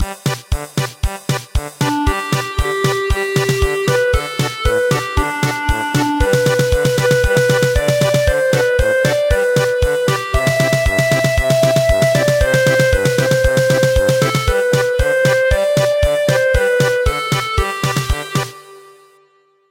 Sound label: German Polka